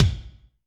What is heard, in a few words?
Kik Amb Punch, Alesis D4, Drum Machines